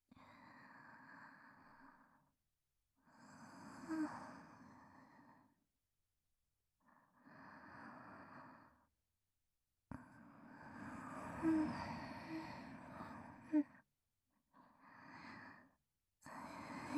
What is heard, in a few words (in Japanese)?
寝息; SEなし